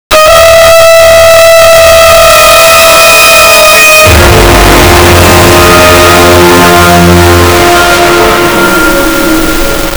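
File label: epic cinematic orchestral; dark fantasy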